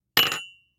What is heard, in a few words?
Cup Of Tea